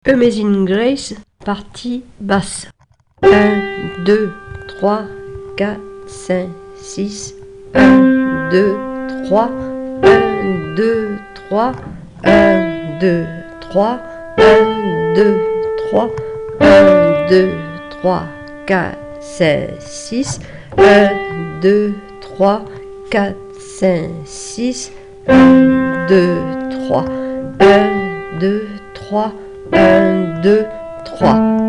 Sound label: Amazing Grace Basse